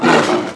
bigfoot attack

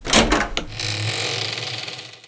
door

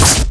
SKY MOON hit; sky emperor

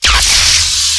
necromancer attack

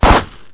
summon dual cannon

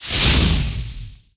fireball

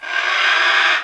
mantis damage; angra

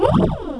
snake damage; dark snake lord

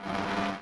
hornet stand

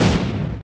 hells drive; hyper novice

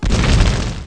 bombard; night watch; mission

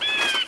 firewind kite stand

